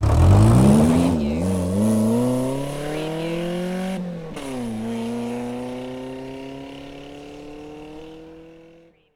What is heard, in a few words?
Car Motor Drive Away